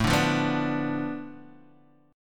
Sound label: B Diminished A, guitar; Bdim/A Chord {5 5 3 4 3 X} strummed